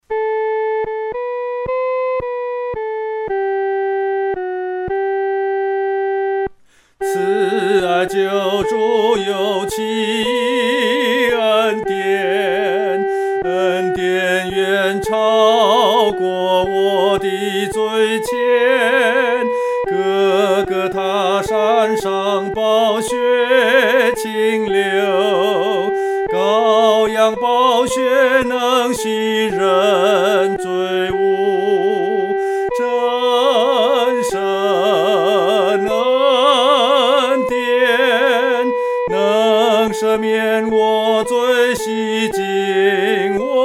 独唱 第一声

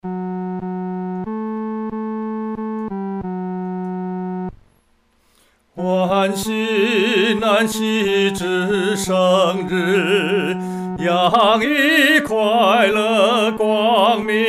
独唱 第三声

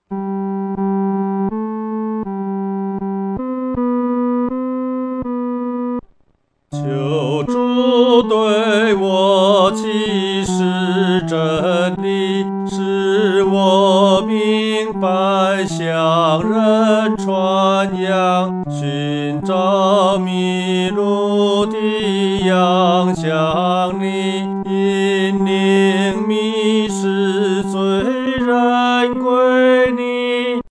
独唱（第三声）; 求主对我说

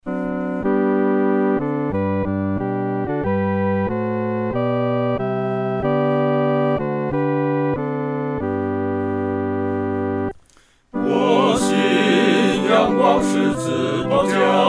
合唱 四声部

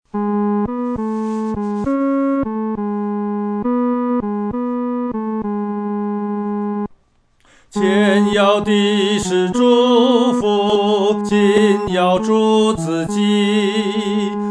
独唱 第三声

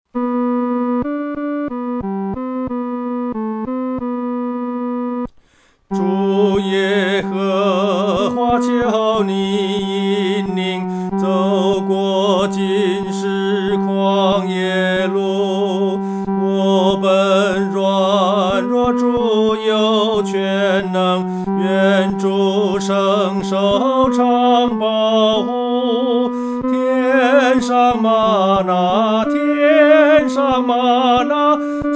独唱（第三声）

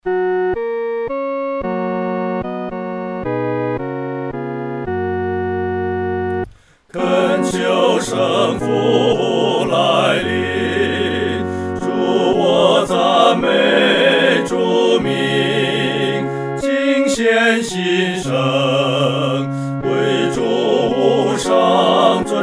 合唱 四声部

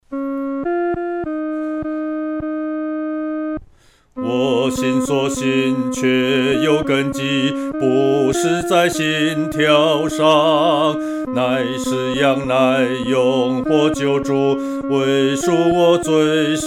所信有根基 独唱（第二声）

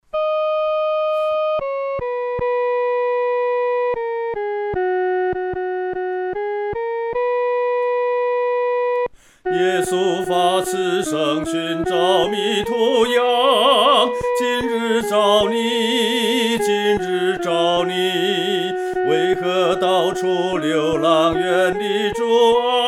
耶稣恩召 独唱（第一声）

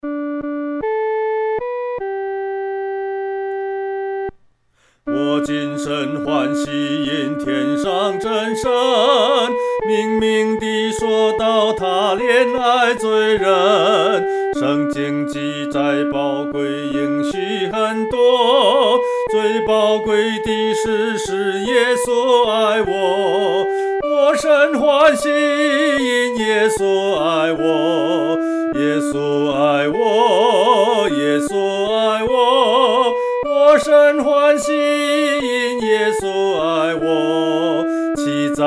独唱 第一声

